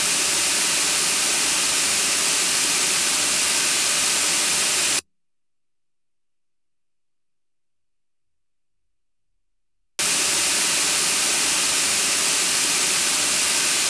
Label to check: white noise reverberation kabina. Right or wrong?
Right